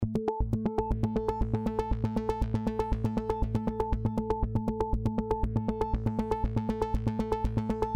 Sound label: arturia cs 80 seq